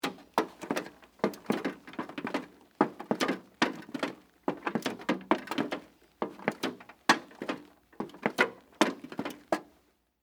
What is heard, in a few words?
Scaffolding Walk, Footsteps, Wood, Metal, Surface; Footstep Sound Effects; BlastWave